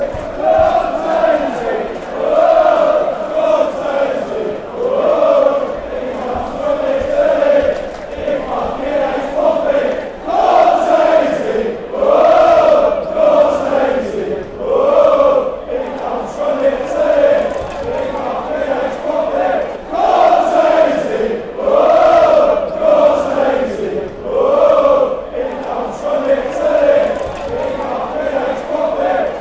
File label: he comes italy, chants